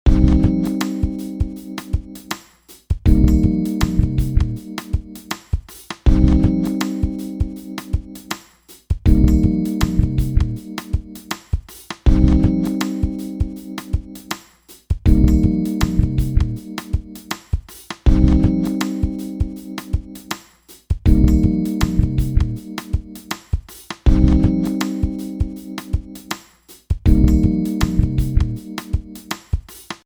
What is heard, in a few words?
radio jingle